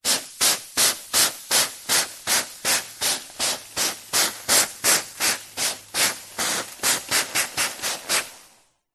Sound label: household water spray bottle spray onto oven hob hot sizzle rhythmic; zapsplat